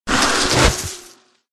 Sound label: flower death